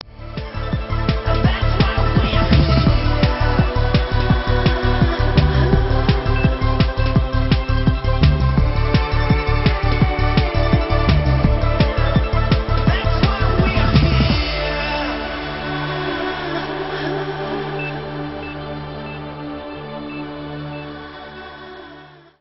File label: trance mix